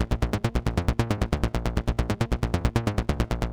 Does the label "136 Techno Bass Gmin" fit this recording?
Yes